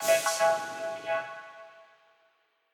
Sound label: Quasar Clear B; Mixolumia